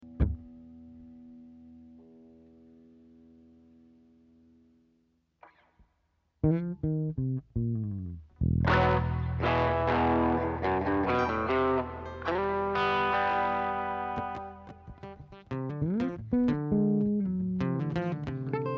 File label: Guitars and Bass